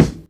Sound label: Kicks Loud